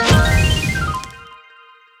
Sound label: prize hit; Fenix Play 27 Deluxe; Wazdan